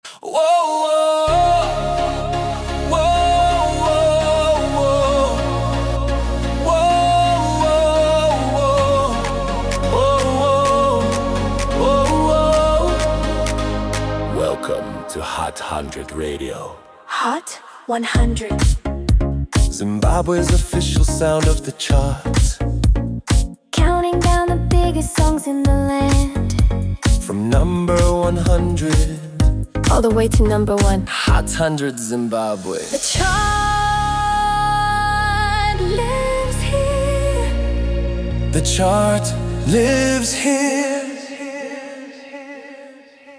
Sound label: jingle; radio